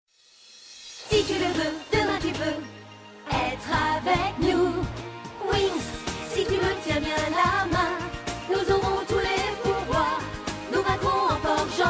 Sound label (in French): générique français; winx club